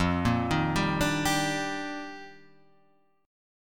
F 13th Suspended 4th F, chord

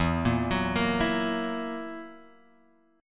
E Suspended 2nd Flat 5th E down Guitar Open G

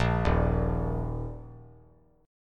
D sharp 5th; arpeggiated; x,x,1,1; JGuitar

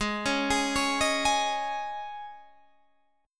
Eb 7th Suspended 4th

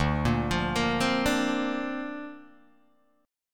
D-Minor 7th Sharp 5th D Guitar Open G, chord

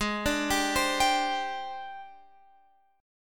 G sharp Major 7th Flat 5th; x,x,x,13,14,14,14,16

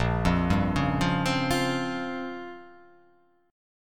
Bb Minor 6th Add 9th Bb down; arpeggiated; JGuitar

